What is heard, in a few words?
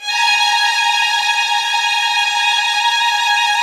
Violin G#5; Q Up Arts; Denny Jaeger Master Violin Library; PERFORMANCE